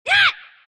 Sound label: attack short; Dark Willow